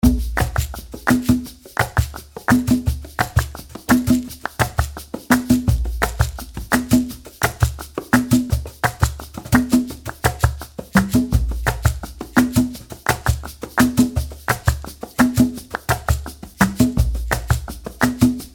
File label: Flamenco Percussion; vanilla groove studios